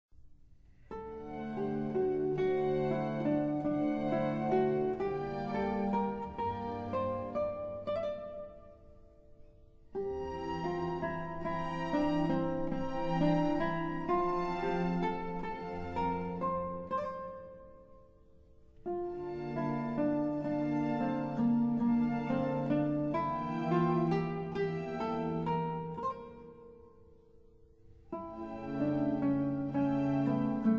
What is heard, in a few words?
Concerto in D minor for guitar and string orchestra; Baroque guitar concertos; Baroque Guitar Concertos- Vivaldi, Handel, Weiss, Fasch